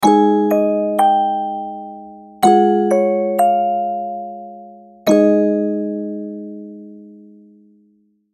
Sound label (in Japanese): ミステリー オルゴール